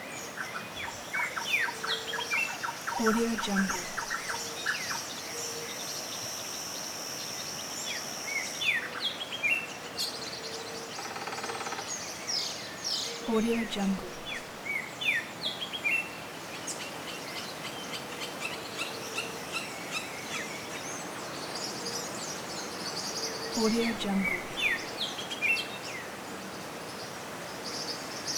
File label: landscape with birds